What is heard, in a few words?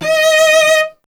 STR VC D#0, Cello; Miroslav Vitous; Solo Instruments VOL-1